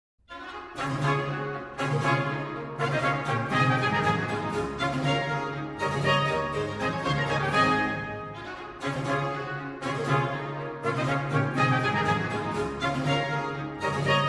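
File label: orchestral suite no 3 d major bourree; bach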